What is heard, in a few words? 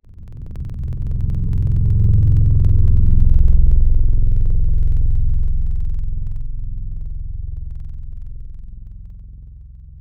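strings Staccato, Wavetables; Synthetic's Serum Collection; Standalone-Music SYMPHONY Wavetables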